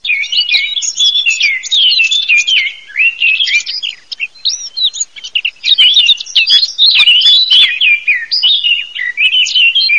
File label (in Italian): CAPINERA CAPINERA